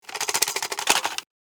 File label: Cassette case shaking with tape in case